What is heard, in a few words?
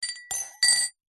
household cutlery item set down into glass; zapsplat